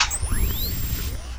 laser recharge

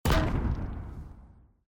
explosion

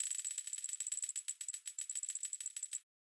money going up

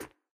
land metal

